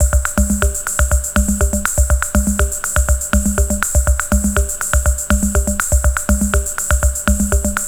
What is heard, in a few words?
LOOP 122; Best Service; Hyper Dance